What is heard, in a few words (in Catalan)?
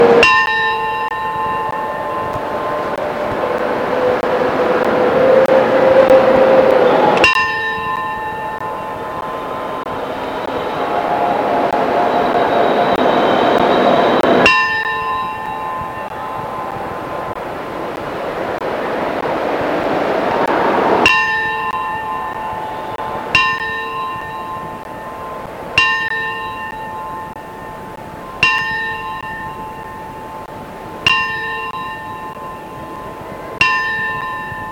campana